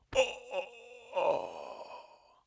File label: Man Hit